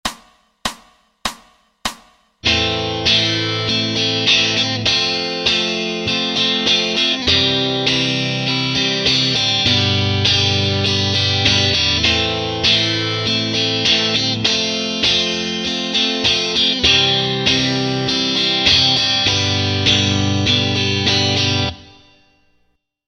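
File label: Rhythm Guitar Strums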